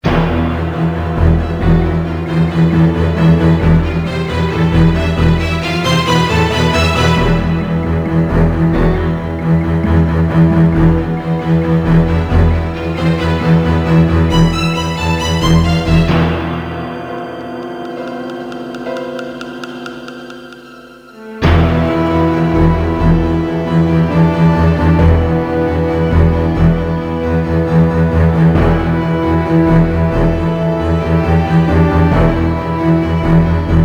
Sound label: fast, music; Soldier Of Fortune II Double Helix; XBOX; finca